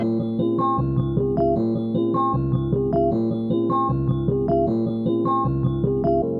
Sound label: synth; Pony Fan Music Remix Stems